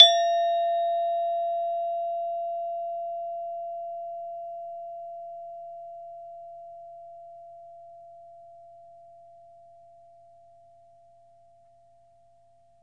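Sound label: SARAN F3, Metalophones, Pitched Percussion; E-MU Producer Series Vol. 7 – Old World Instruments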